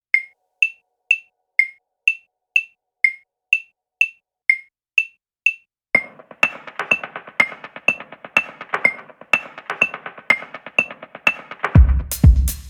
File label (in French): contemporain, 124 bpm, 3-4; musiques